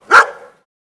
Dog Bark Generic Clos

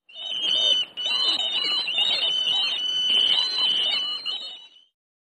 Bats Squeal High Pitc

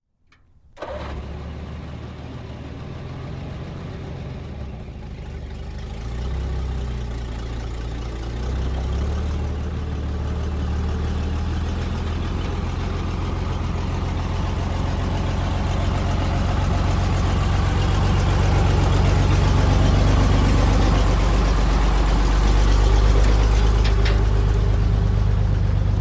Truck 2.5 T Start Away; 2.5 ton

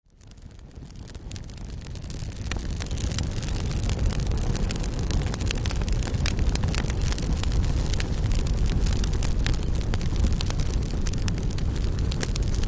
Campfire Medium Fire

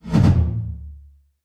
Bass Impact Double

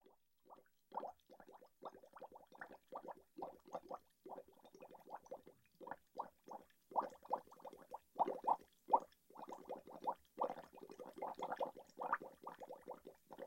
Bubbles Steady Light

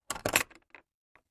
Latch Open, trunks

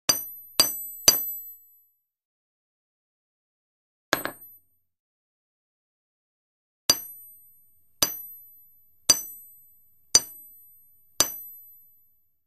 Anvil Hits 3 Metallic

